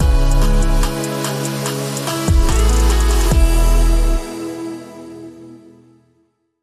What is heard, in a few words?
Bumper